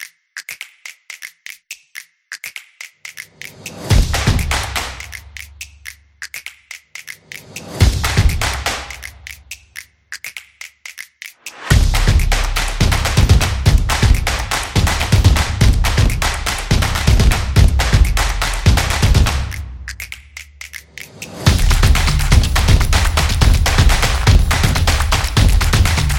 Drum Beat Upbeat

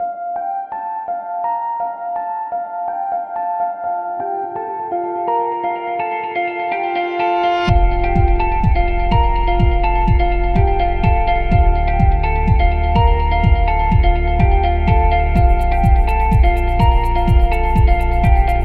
Minimal Technology; agency promo

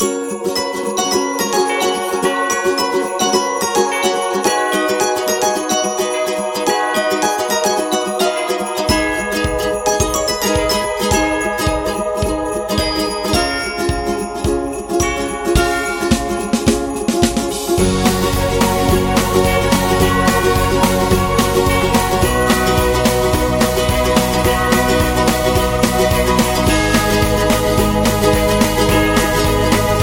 Christmas Happy Upbeat Music; xmas

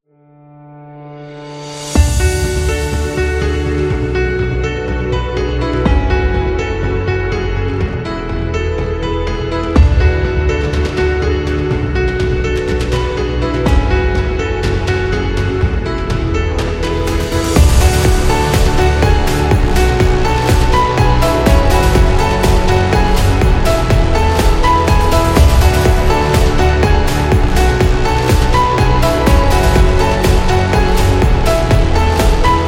Motivational Dynamic; corporate music vol 22

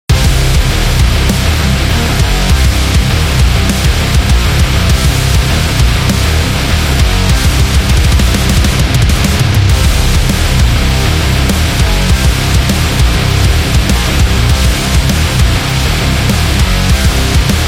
Metal Action

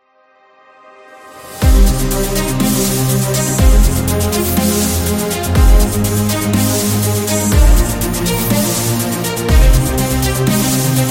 Epic Powerful Trailer, epic music; epic music vol 4

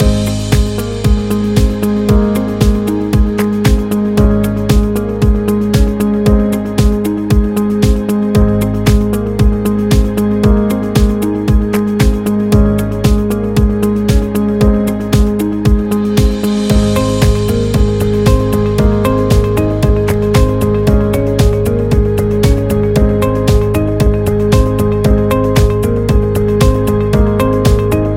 Positive Corporate Loop

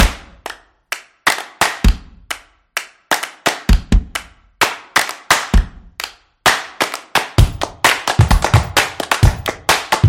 Hands, percussive; percussive nation vol 2

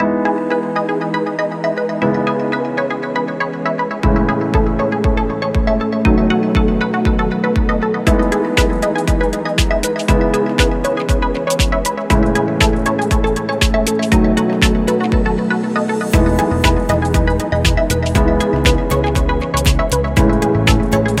Stylish; healthcare

